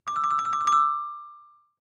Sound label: Clavichord Trill; keyboards